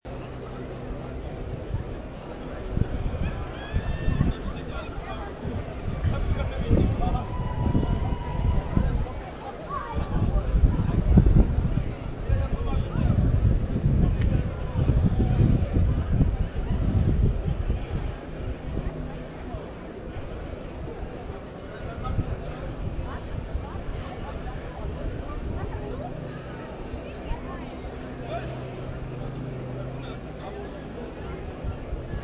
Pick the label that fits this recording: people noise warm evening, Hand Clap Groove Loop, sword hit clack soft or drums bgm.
people noise warm evening